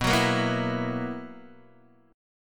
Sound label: B Major 7th Suspended 4th B down Guitar Open D